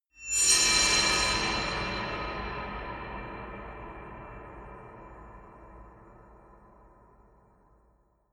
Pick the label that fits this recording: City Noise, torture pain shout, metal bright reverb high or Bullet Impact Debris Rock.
metal bright reverb high